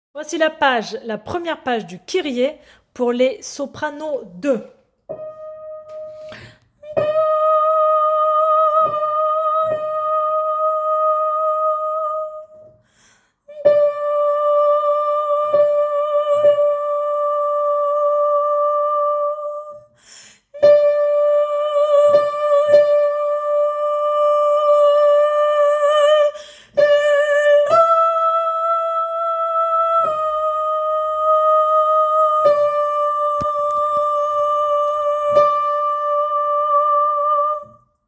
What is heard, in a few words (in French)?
soprano; Kyrie intro